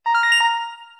alert; reddit

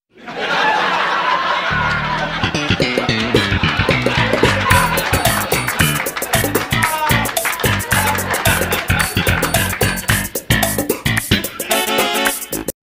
sitcom crowd laughing; seinfield

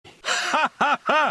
hhhah haah heh; neco arc chaos

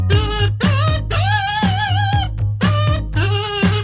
kazoo

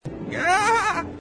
ahahaha

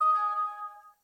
new message

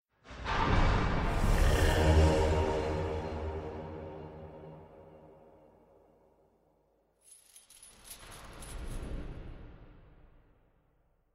jingle

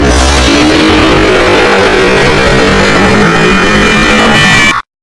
goofy jumpscare sound

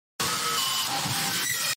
jumpscare; ambush

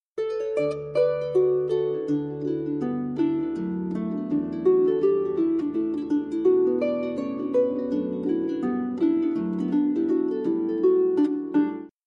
beep

